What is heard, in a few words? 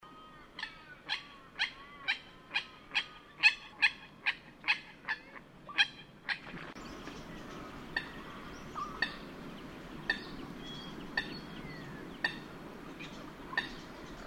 Eurasian Coot